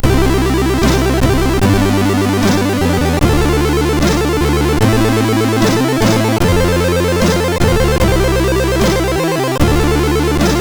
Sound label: sid MOS6581R4